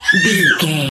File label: voice baby cry funny scream